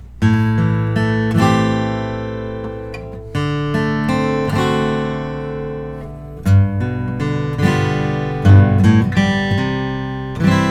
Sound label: taylor style 2 engleman; 2008; gr natural